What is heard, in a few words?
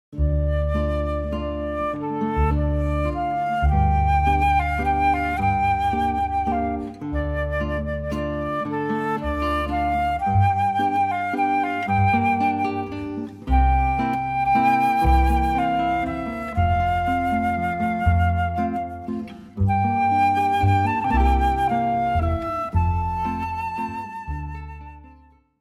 guitar flute bass trio; Y'did Nefesh